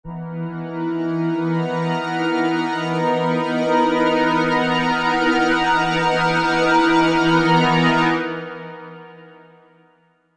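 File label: Synth Pad B major 7 on E; Sonic Foundry (Sony Creative Software); Techno Synth Loops 1 Signals I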